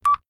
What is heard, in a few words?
Beep Short